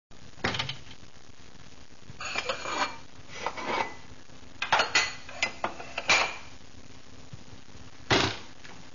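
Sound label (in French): ambiance déjeuner bol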